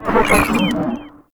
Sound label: Moa Investigate A, Vocals